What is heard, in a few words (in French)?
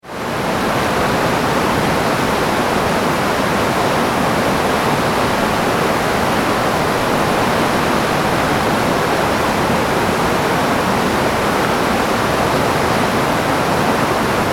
Berarde parking